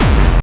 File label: Bassdrums Hard Kicks; Vengeance Samples